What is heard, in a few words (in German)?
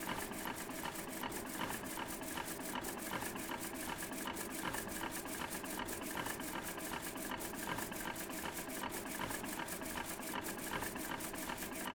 Maschine, Webstühle; TIM - Augsburg; 24-Bit 192 kHz